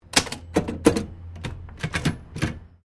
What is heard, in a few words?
Typewriter Remington Standard 10 1900s Sequence; Old typewriters; Typing; Offices